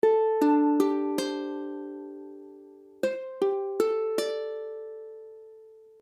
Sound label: D7sus4, ukulele